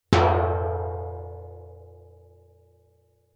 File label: impact metal tray large object strike resonate